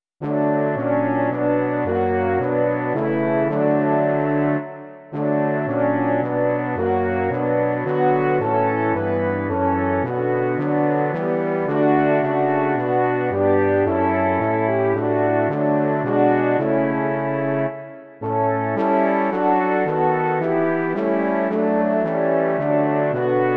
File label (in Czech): trio lesních rohů a tuba; Každý den začínám; Imaginativní verze